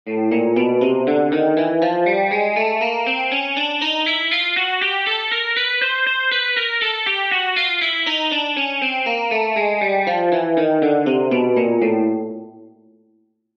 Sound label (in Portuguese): Exercícios Guitarra 120 bpm